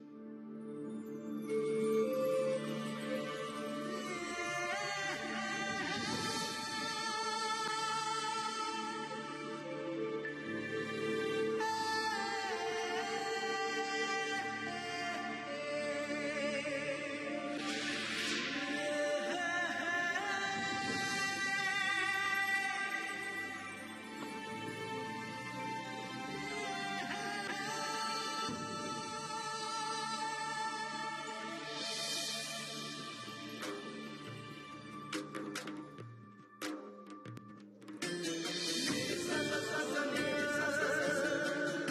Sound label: music; Begusarai